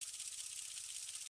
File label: reelspin; Zhao Cai Tong Zi